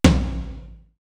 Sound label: ATOM, DRUMS; RY30DRUMS; East West; Global Sounds